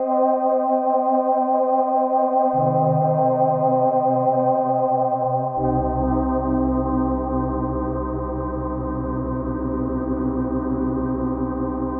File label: SYNTH PAD AMBIENT 160 C, Ambient; eJay; Sphere